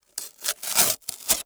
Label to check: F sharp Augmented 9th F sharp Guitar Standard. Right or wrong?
Wrong